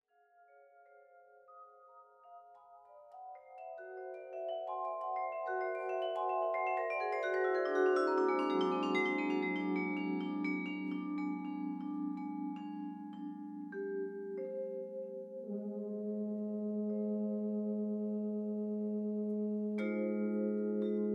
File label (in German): tuba und perc